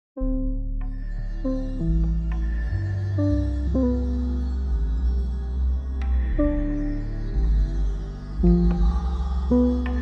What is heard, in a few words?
MIDI